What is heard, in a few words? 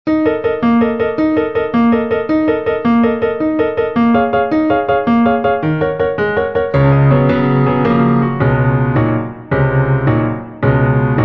Sigh no more ladies sigh no more accompaniment; Three Madrigals 3